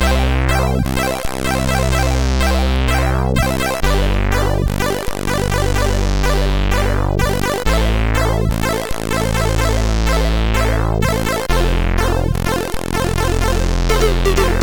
Jingle, sid CSG8580R5; HVSC

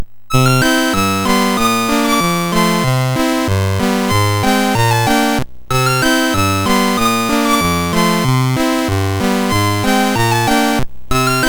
Wobbler, MOS6581R4; hvsc

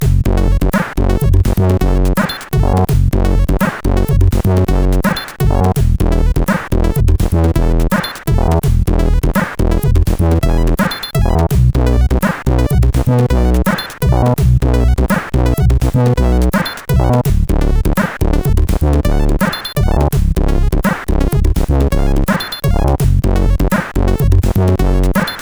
Two voice Test, CSG8580R5

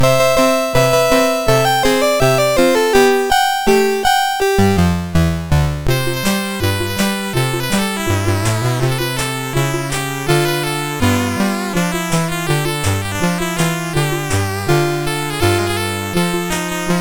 MOS 6581R3; Twisted Christmas

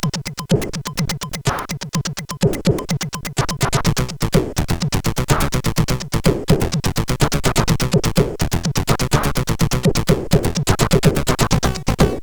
sid CSG8580R5

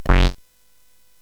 SFX; Narco Police; hvsc

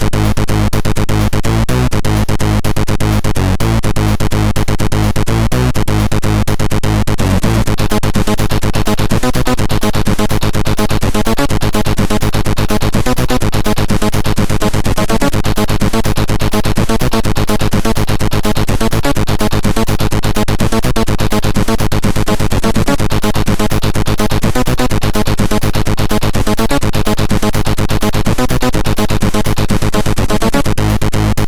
Techno, sid MOS6581R4

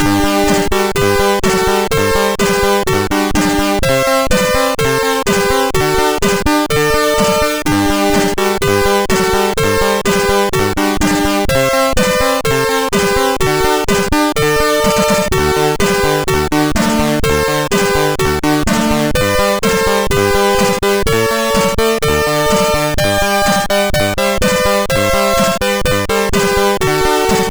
German Anthem, MOS6581R3; Wijnhoven Joachim

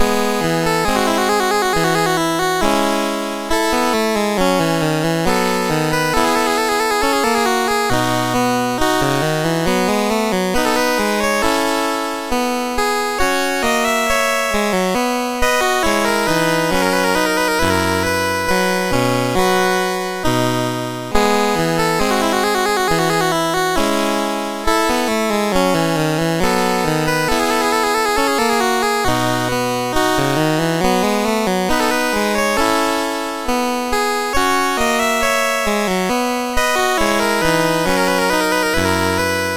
Sarabande, MOS6581R3; Joseph A Grau; Sixth French Suite

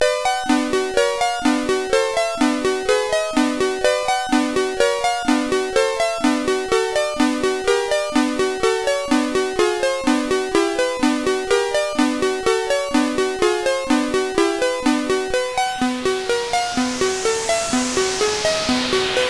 Game Music, sid CSG8580R5

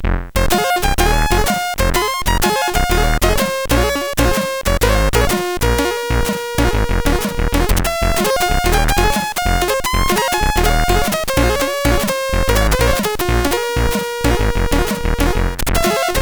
Rag sid CSG8580R5

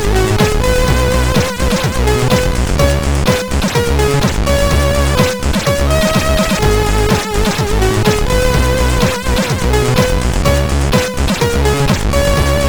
Dis-co sid CSG8580R5

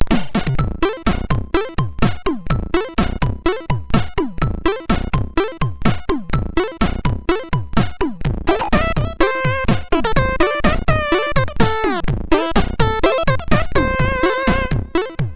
Altered States Tune 1 sid MOS6581R2

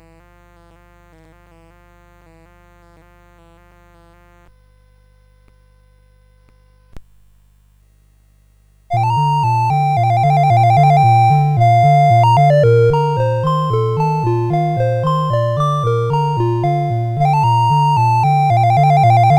CSG8580; Chinese Dance

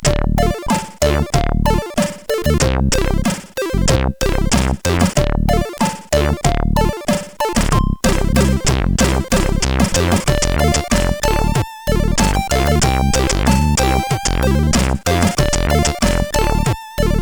Pure 2, sid; CSG8580R5; hvsc

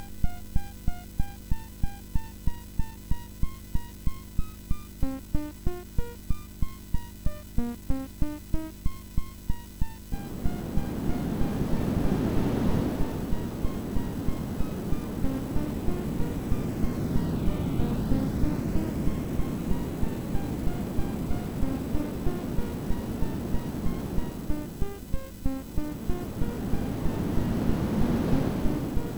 Wind, MOS6581R4

